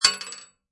Bullet Shell Drop Rifle Metal, empty Rifle shell falls on metal; Impact Sound Effects; Lynne Music